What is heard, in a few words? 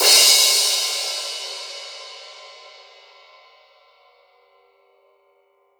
Y9 Crash; Akai MPC500